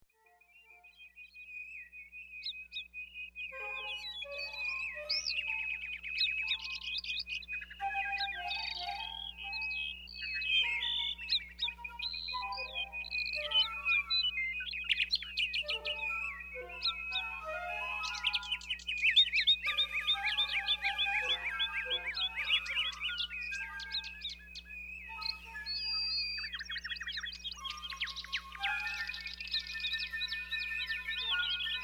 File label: flute bird